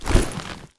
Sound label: Grunt Mvmt FOL Soft Med; Titanfall 2